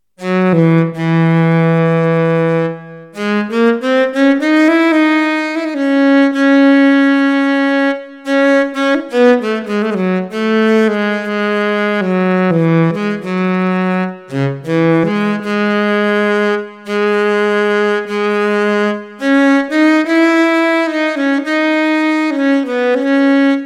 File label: Dark Violin